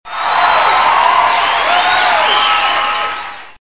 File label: 愉快 applause